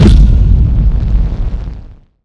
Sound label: explode; PixelPerfectionCE; minecraft